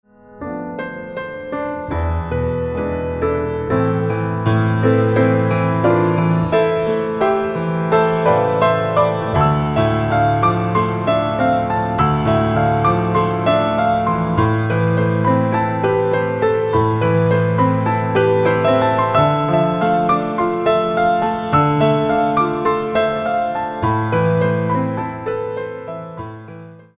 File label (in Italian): Concerto per solo pianoforte